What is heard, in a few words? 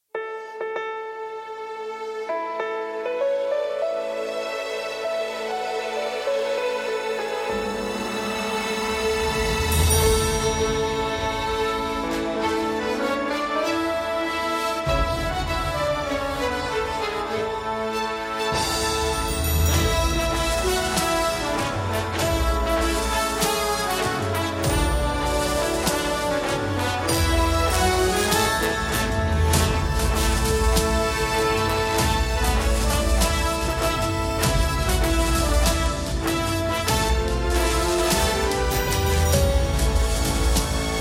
watani